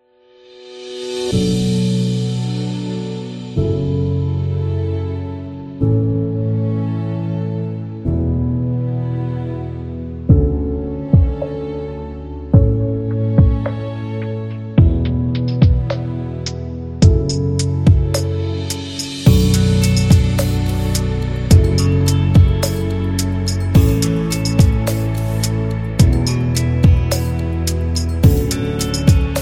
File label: Luxury Ambient